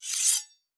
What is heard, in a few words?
Weapon Draw Metal; Fantasy Sounds Bundle; Medieval Combat Sounds